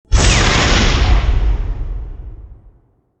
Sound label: hull hit; explosions; TOS